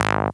Move; breakout; farm

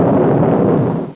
basic grounder die; 300 games for mac; Arcade; F.A.R.M. Patrol

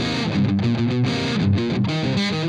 Scatter Puppies, Guitars; ACID Music; Scatter Dogs

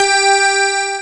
signal; SEGACars

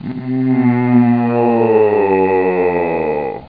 MOAN; scare

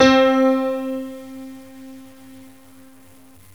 honky-tonk, piano; gmplay

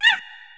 monkey growl, monster

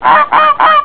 Honk; Honk if U Luv Puddles; Font Gander Pro 1.6

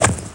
ROUGH; Jack

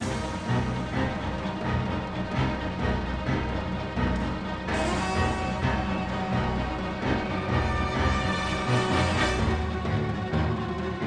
splash

